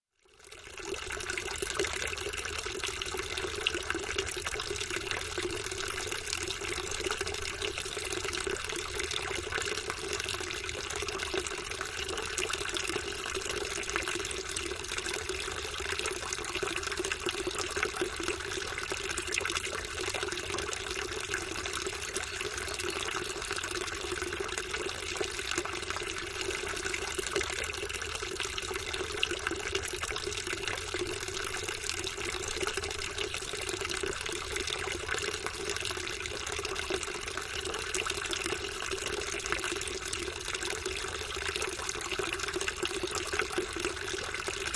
Water, Country Fountain

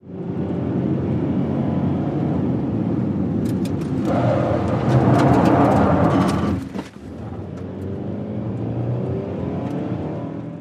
Sm Car 180 Skid, compact

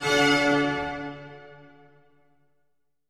Music Classical Str, orchestral

